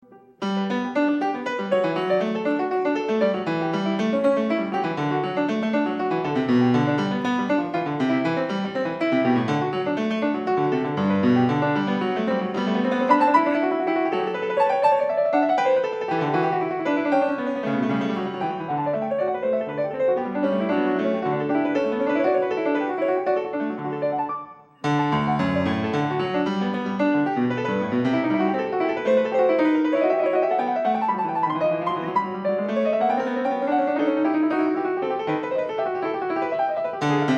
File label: Bach Goldberg Variations a 2 Clav, PIANISTS